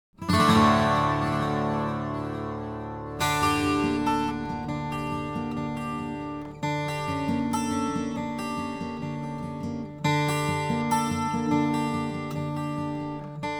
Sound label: Guitar; Diablo Anniversary